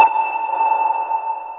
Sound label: ping